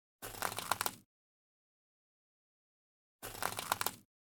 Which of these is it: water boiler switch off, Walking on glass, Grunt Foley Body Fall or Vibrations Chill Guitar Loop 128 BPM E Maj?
Walking on glass